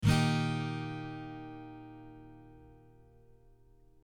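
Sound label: E♭, guitar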